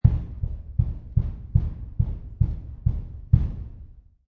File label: Small Door Knock, Hard knocking on small wooden door; or knocking from inside a coffin, grave, casket, crypt